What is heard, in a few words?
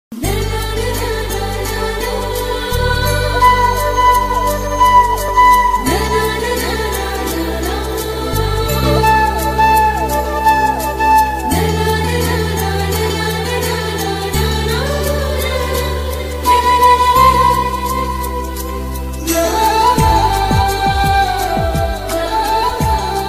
love story flute bgm ringtone love music ringtone